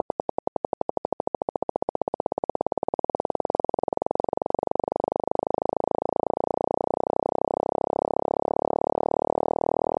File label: clicks